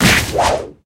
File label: hit